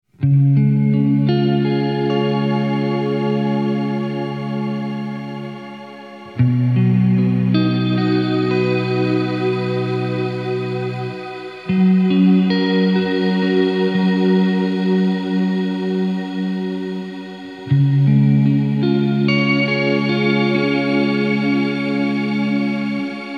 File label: Watery Echoes; blueSky